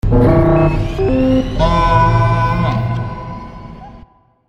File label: Drone